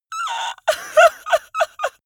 male crying; comedy-cartoon; soundpool

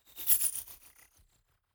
fly by insect drone flutter; swooshes-swishes

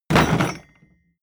wood impact rattle

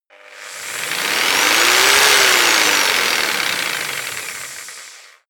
jigsaw engine medium fast medium length; maschinen; saege

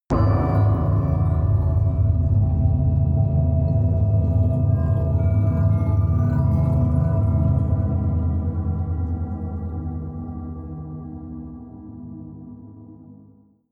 scattered water shards; atmosphaeren umwelt

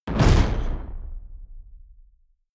sci-fi space gun zing; pistolen gewehre

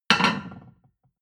plate on plate place medium, restaurant cafe

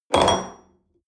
espresso upset table medium, restaurant cafe

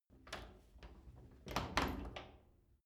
wood door old lock

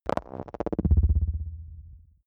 click ready boot low, signale

